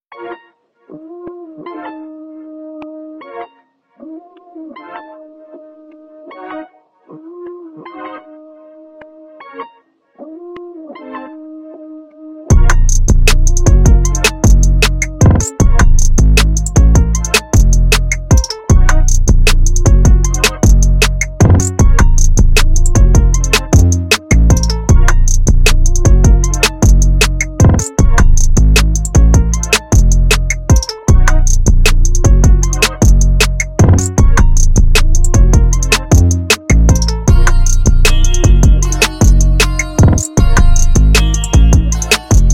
Instrumental Rap Hip Hop Beats Freestyle Fast Trap